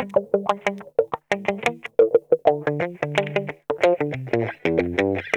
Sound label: CRO MAGNON, Funk Guitar; Zero G - Funk Guitar